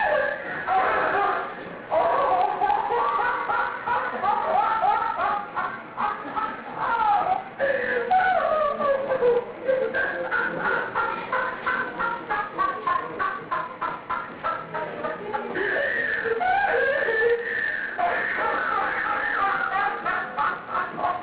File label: laffing